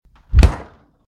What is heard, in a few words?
Fridge Close